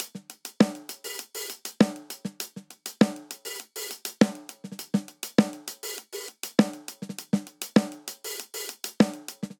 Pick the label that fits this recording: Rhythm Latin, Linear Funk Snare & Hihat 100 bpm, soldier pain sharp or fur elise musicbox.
Linear Funk Snare & Hihat 100 bpm